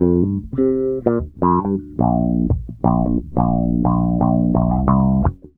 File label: FUNKY 86 C, Bass Grooves; USB Soundscan vol.30; AKAI